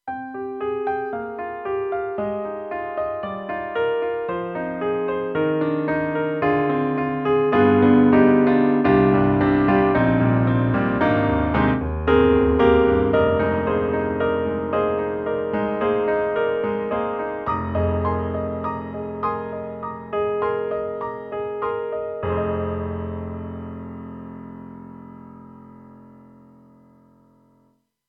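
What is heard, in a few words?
Natural Warm Grand, piano; KORG M3 XPanded Program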